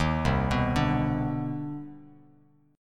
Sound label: C Minor Major 9th, Bass Standard, CmM9 chord; arpeggiated; JGuitar